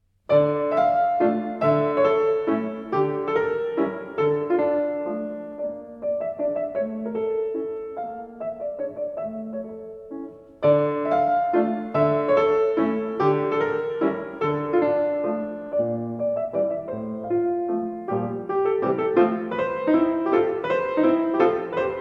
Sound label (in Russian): f-no, Mazurka; Chaykovskiy; Detskiy al bom